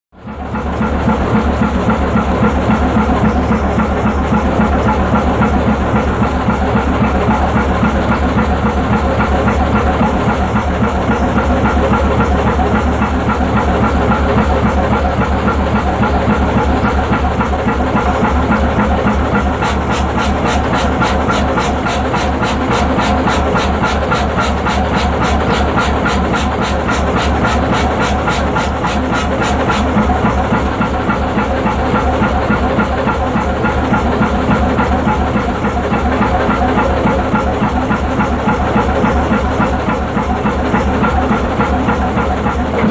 Boat with Engine; Run / Stop ( Ext. ); Barge Engine. Runs Slow And Then Returns Back To Normal And Stops.